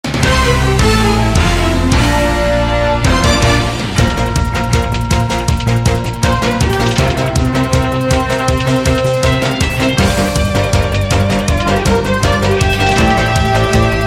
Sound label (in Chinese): 片头音乐; 3分以内